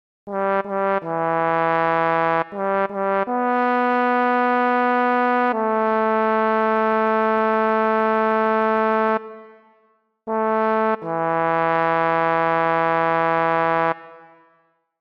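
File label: Baritone, barbershop tags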